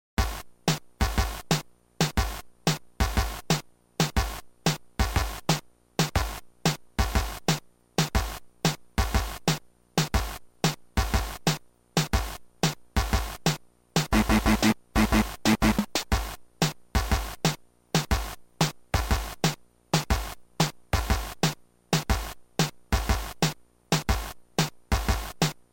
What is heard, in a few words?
drums, steel